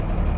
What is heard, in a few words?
boat rev down